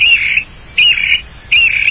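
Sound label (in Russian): звонкий оглушительный свист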